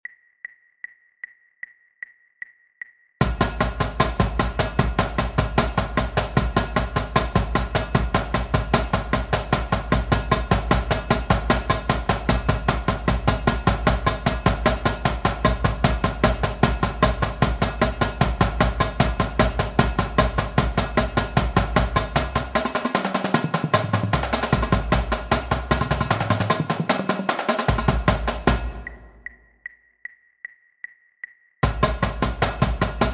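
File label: sequence, Drumline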